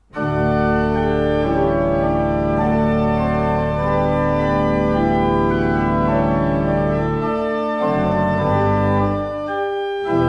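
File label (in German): Orgel, pfeifenorgeln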